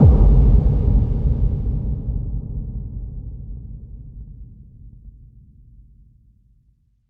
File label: Impact Low; KSHMR